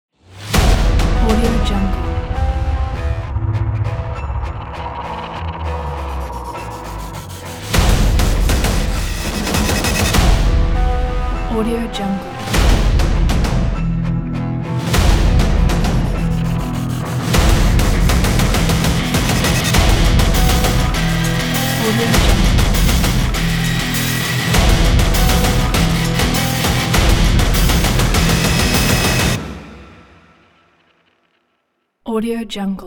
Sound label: aggressive cinematic metal teaser trailer